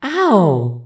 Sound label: ooof; rosalina; SM64 CO-OP